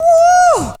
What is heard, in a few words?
VOC